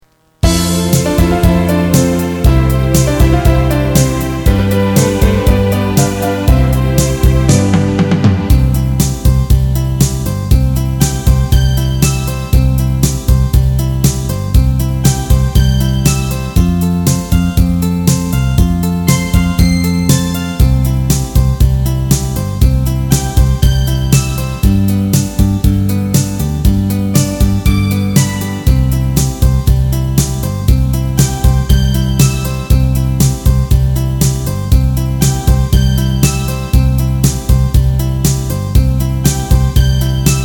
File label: background music